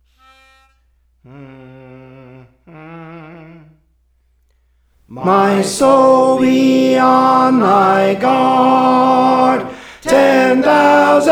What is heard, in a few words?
Tenor; Praise For The Lord Training; My Soul Be on Thy Guard